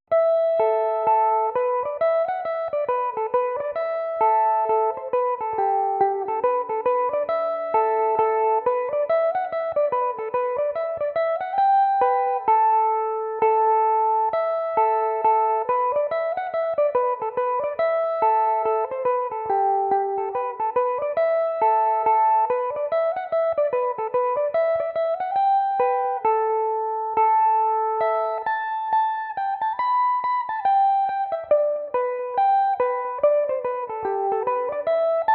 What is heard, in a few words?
o neills march ador, mandolin